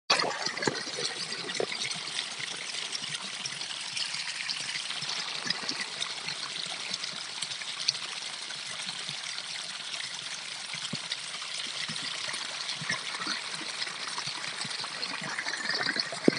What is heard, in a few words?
water sound natural